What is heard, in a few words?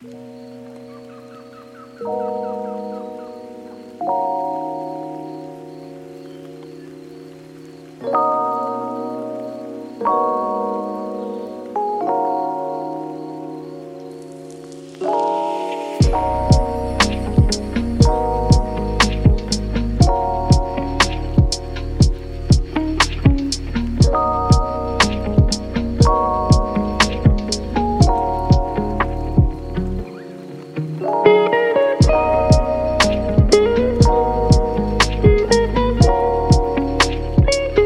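chimenea, Chill Beats, lofi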